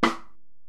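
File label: Acoustic Snare, Alesis DM-PRO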